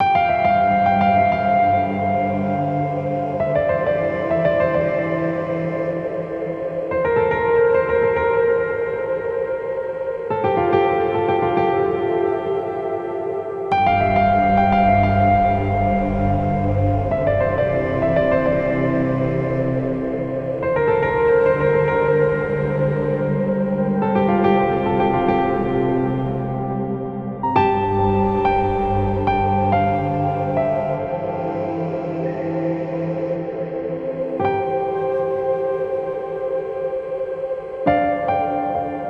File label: 70bpm sentimental piano; sentimental piano 2